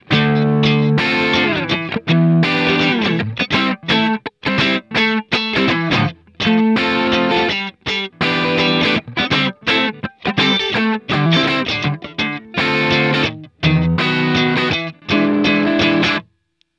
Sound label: clean e guitar dry